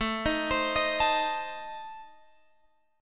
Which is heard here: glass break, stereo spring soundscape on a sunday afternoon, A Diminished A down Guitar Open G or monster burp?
A Diminished A down Guitar Open G